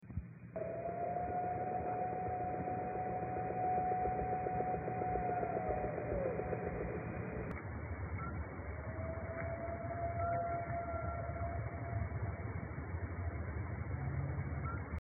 howl scream; Vocal comparison with Stevens Pass Recording; Washington state; snohomish

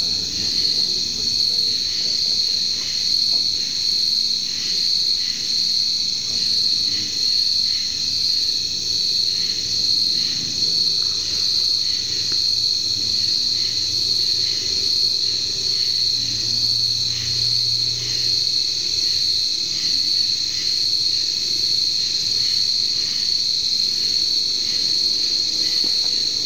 combo wood knock; This is the same event as above, however, we have taken the audio from both of the Parabolic dishes that were at this location and combine

